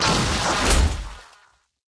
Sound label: dead, monster